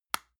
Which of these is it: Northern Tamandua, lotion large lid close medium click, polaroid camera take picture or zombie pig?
lotion large lid close medium click